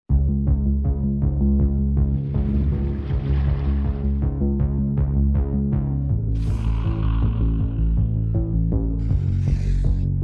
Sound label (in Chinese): 专题片背景音乐